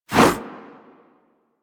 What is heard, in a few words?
Sentient Brachiolyst Melee Whoosh; Warframe; Sentients